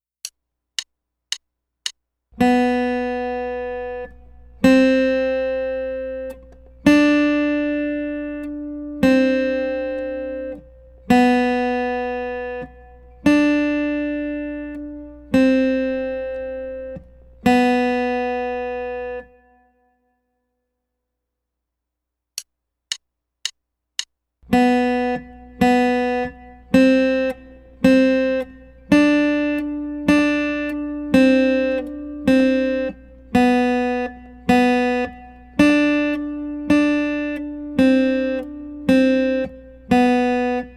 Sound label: B Whole Notes Half Quarter